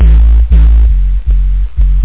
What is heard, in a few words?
special BASS; Utilities Professional